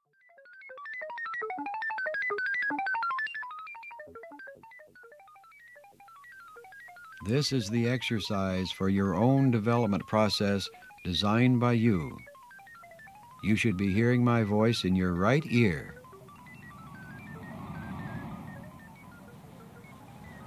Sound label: gate